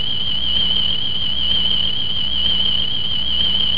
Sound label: Tricorder; Treck